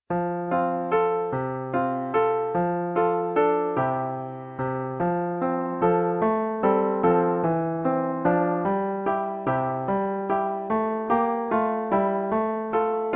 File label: music; norge